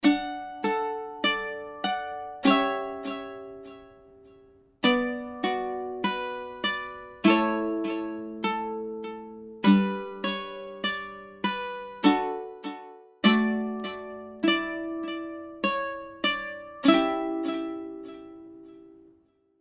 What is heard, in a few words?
delay